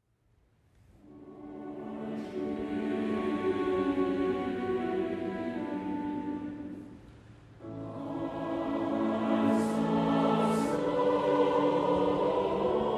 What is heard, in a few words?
Choral Response